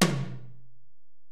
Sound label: Tom, Alesis DM-PRO, Drum Machines, Toms